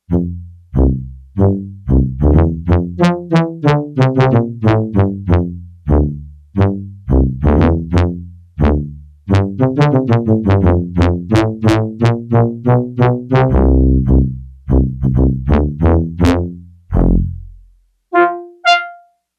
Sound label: Tuba, Yamaha TX802; Factory Patches; Voices